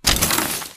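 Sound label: Tick Movement Footstep Jump Land; Titanfall 2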